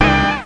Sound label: Frost Horn; NetHack